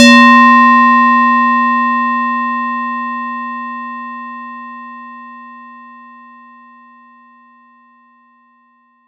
FX RINGR; ModelCycles

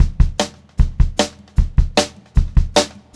Brushed Drum Loops; USB Soundscan vol.39; AKAI

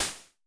Snare Hats, Vermona Mono15 Drums

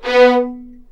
B3 VLNS ARCO DB; East West; The Ultimate String Collection

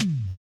Low Tom, Yamaha PTX8

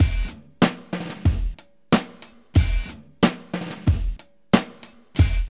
DRILLER 92, Funky Elements LOOPS; Zero-G

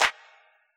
hand clap, Yamaha DJX Rock Kit; 144 Rock Kit

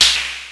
Snare Drum, Cwejman S1 MK2

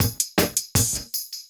LOOP, 160 BPM, Drum & Bass

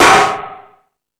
Hip Hop, Drum Machines E-MU Pro-Cussion

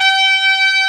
TR C5, trumpets; East West - Global Sounds

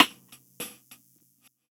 Rim, Roland MV8800

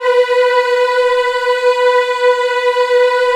B3 WOMEN EH, Choir Acoustic & Synth; USB Soundscan vol.28; AKAI

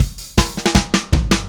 Happy Pub Beat Fill B; Sonic Foundry (Sony Creative Software); Indie Rock Anthems; Session 05 - Happy Pub

